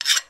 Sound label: scrape, metal